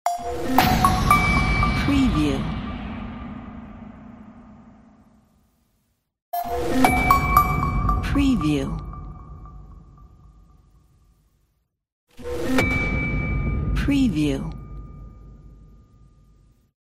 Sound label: Horror Ding; Sound Effects; scary ding sound